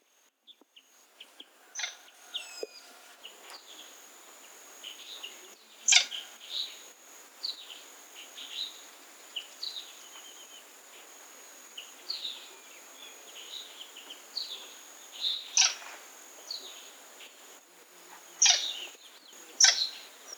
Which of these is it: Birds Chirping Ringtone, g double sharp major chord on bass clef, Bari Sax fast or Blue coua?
Blue coua